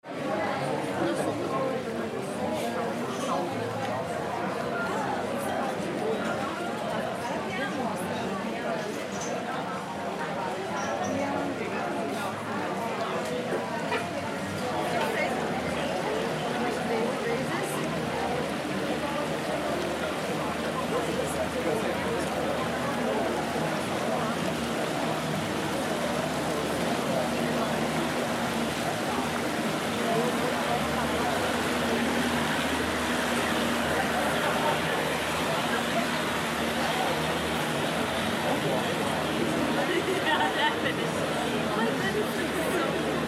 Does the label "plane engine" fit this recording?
No